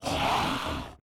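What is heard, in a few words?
hellhound damage; monster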